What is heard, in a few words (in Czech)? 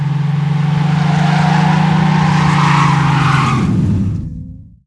auto; Mafie 1